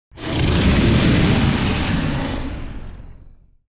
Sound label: Dragon aggressive roar attack